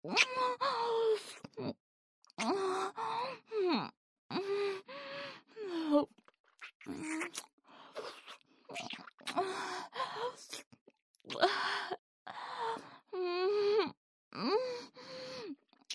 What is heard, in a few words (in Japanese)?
ラブラブキスループ